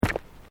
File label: Footsteps Boots Road Step; Footstep Foley; Footsteps (Sound Effects:Human)